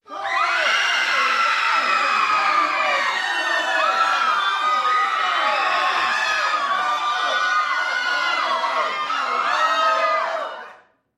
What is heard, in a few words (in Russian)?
zvuki s krikom goriashchego cheloveka